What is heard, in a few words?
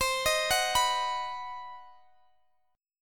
C Minor Major 7th Flat 5th, Guitar, Standard; arpeggiated; x,x,22,20,19,19; capo=2